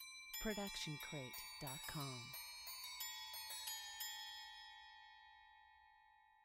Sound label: Christmas Bells; SoundsCrate